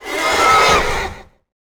troll die

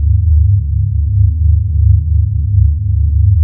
impulse loop engine; Earth and Beyond; EA Games

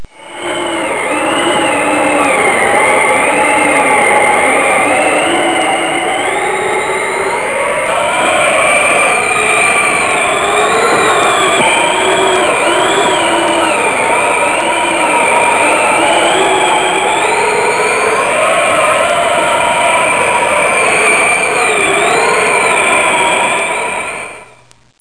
Blizzard, Nature; Soundsation

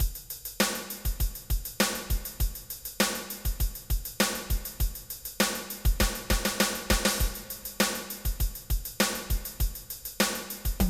FUNKY

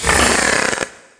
barracuda hit; DAoC; Toa

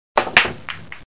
pool

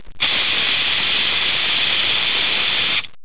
spray; 8bit

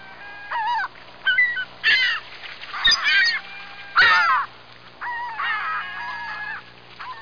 Sound seagulls; The Amazing Spectacular Ordinary Bottle

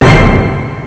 orchest

